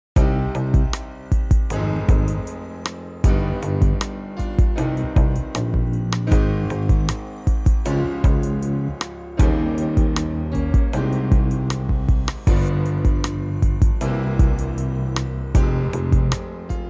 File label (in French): Prélude n°20 en Cm électro tutti; Chopin